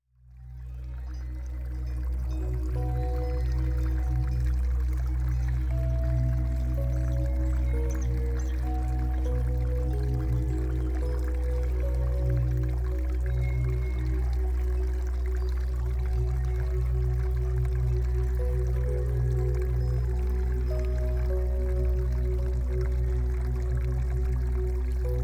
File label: Entspannungsmusik Celtic Harp Soundscapes